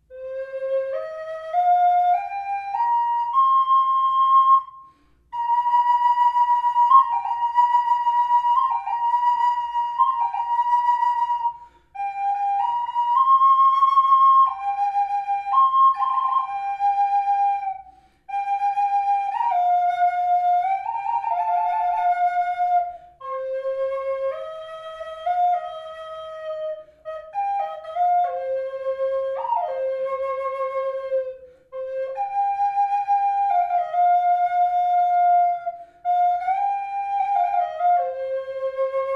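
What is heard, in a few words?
reverb, flute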